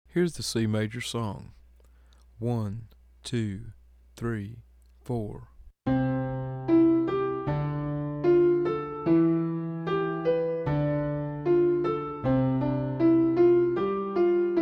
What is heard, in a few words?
C Major Song Slow